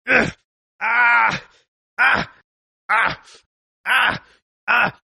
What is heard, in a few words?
Male Hurt Grunts, Human Male Grunts And Yells After To Being Hit, Painful Reactions-6 Take; 6 Takes; Groaning Sound Effects